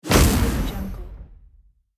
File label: magic thunder hit